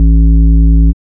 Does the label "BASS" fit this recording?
Yes